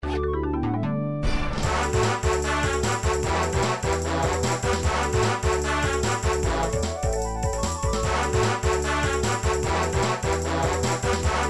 battle victory; snes super mario rpg

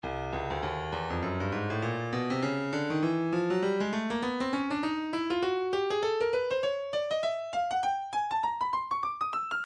breakbeat midi 100 bpm raggastep; Stylus Breakbeat Midi Files

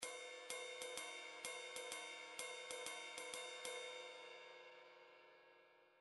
individual drums ride cymbal

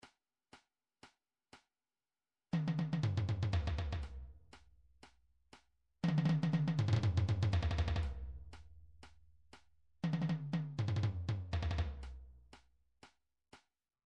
drum trax tom

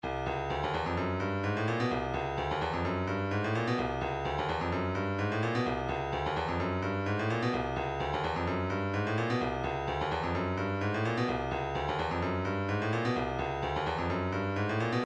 room, midi; 128 keep movin

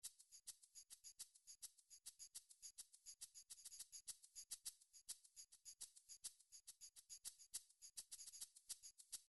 drums

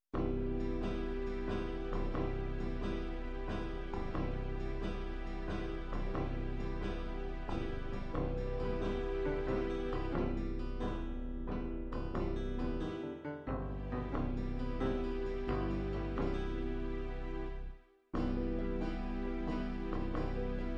english waltz, midi

